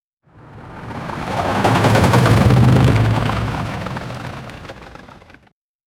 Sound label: 3d rescue vehicle skids